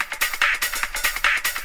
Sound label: Loop